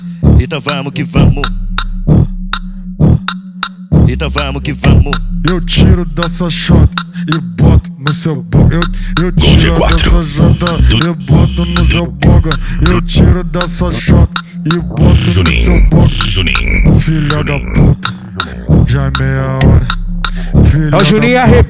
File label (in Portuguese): cd funk automotivo